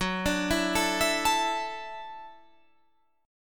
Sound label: E 7th Suspended 2nd Suspended 4th, F sharp, chord